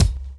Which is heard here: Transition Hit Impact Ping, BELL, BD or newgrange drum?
BD